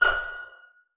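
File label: pizzicato C5 PP, STRING PIZZ; Sound On Sound - Peter Siedleczek's Orchestra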